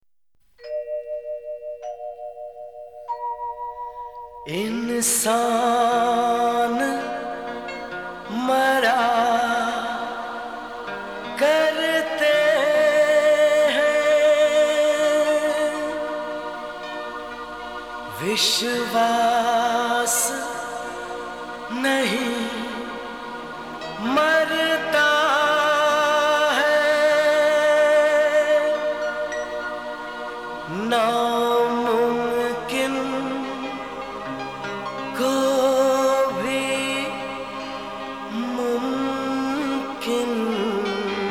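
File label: Sad, Hindi song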